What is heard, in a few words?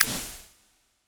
ui page scroll; csgo